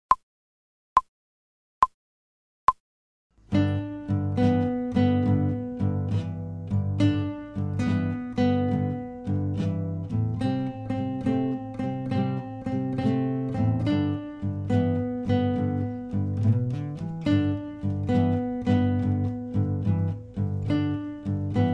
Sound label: Blues Study slow; without metronome